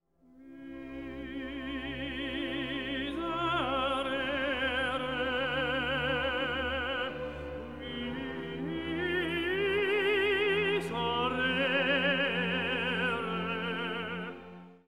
Miserere Solo

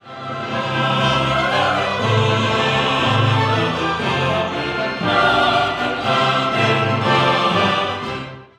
Gloria Amen

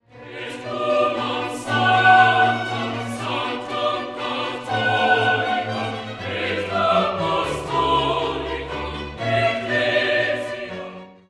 Credo Et unam sanctam

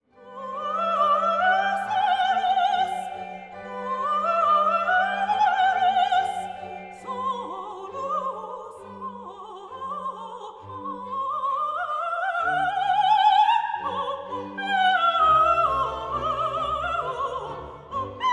Gloria Qu High notes

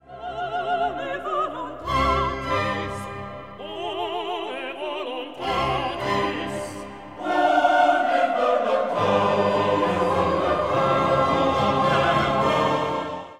Gloria in excelsis Soloists

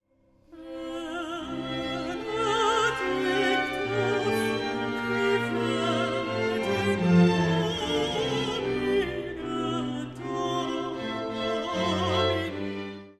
Benedictus Alto

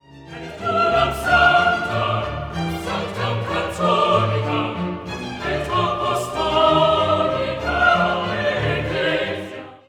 Credo Et unam sanctam

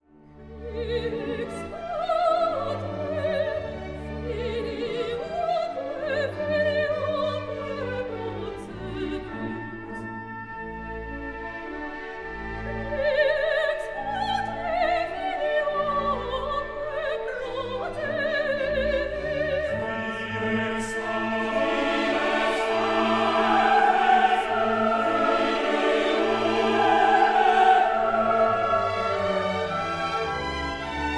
Credo Orch Sopr Chor

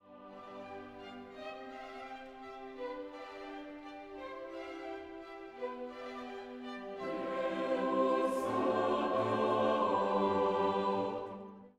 Sanctus First soft

